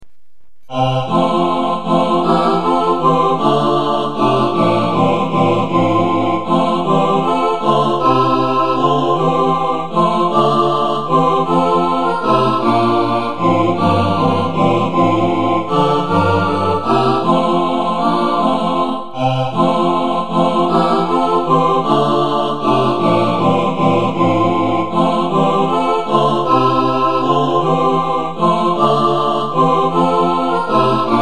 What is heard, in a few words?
satb